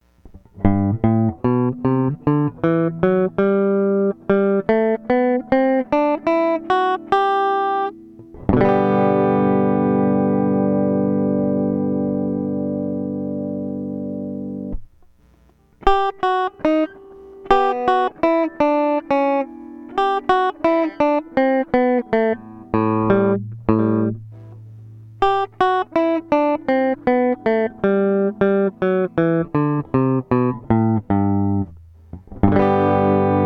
Markbass Compressore E Major, guitars; Pedal Effects

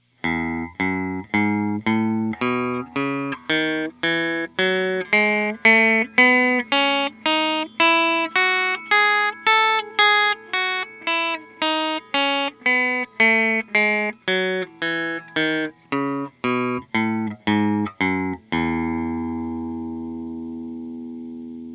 E Major, guitars; More Otto Mods

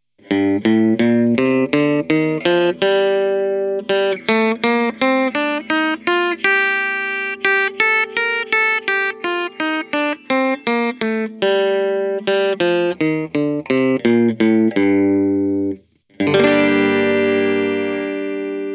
G Minor Bridge Clean, guitars; Otto Power Scale

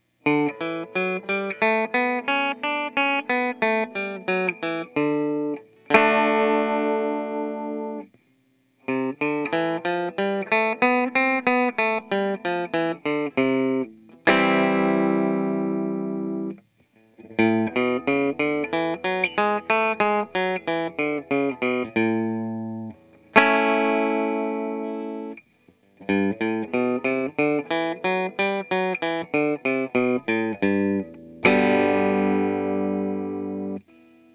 Clean Bridge, guitars Vox; Vox Mods

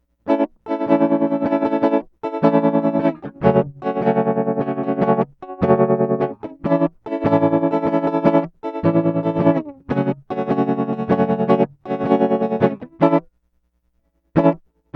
Fulltone Supatrem Max, guitars; Pedal Effects

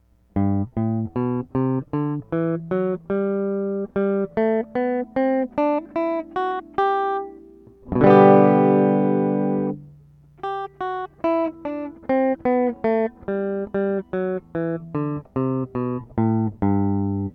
Markbass Compressore Bypass E Major, guitars; Pedal Effects

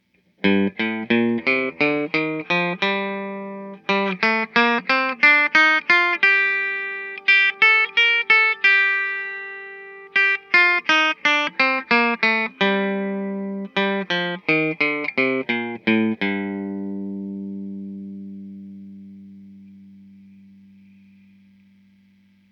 Clean Bridge, guitars; Ardmore Build

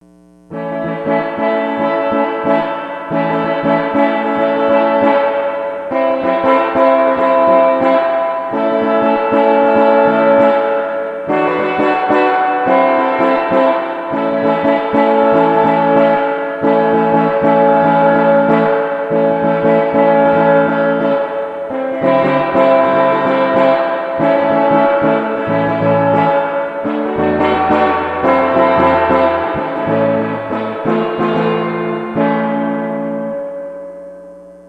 Weber 5G15 Reverb Max, guitars; Pedal Effects

